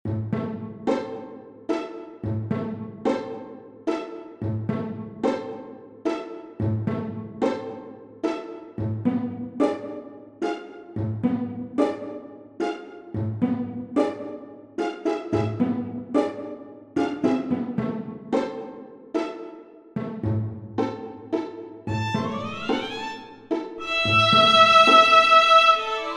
String Quartet

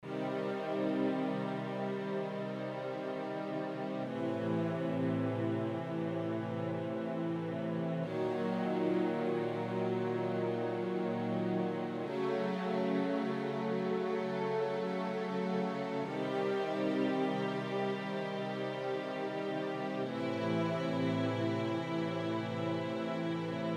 chords

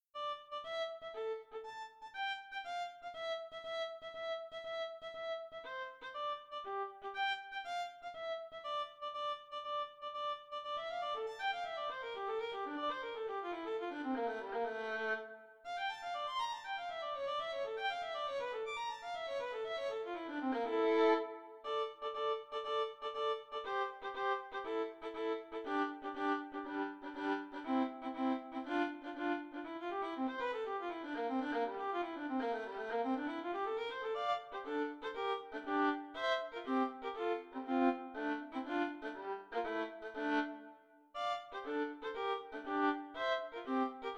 Caprice for Solo Violin

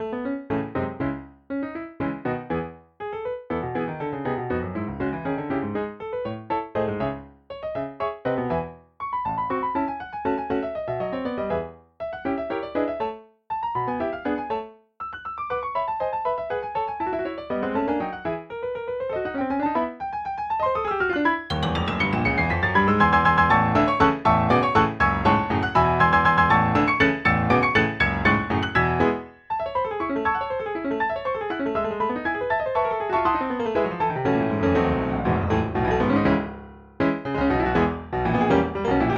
Piano in A Major

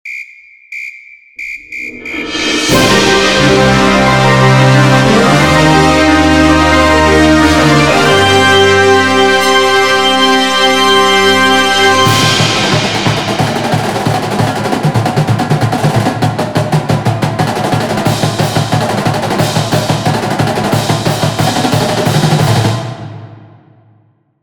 Marching Show; working on a marching show idea

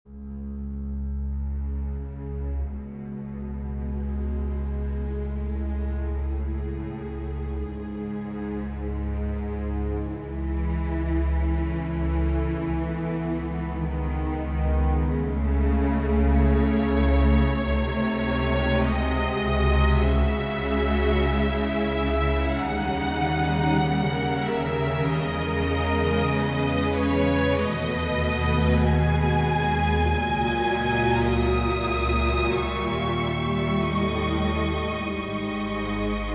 Orchestral Overture in C-sharp minor

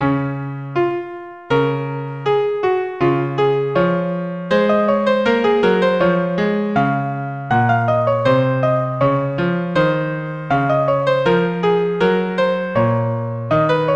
Counterpoint, 2-part invention in Db; Elem. Counterpoint (1910); Exercise 15

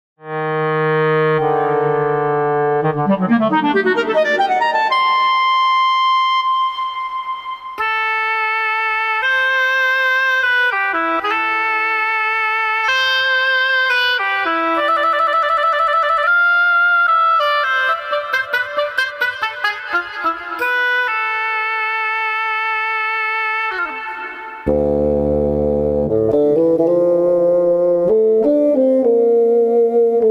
CLARINET OBOE BASSOON

